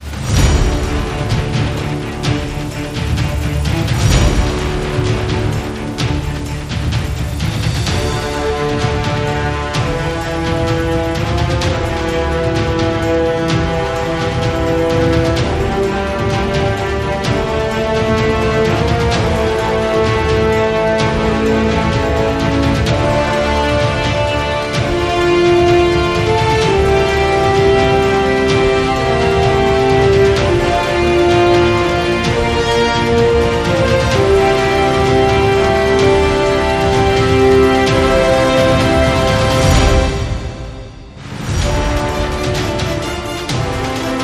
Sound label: We Fight and Win No Choir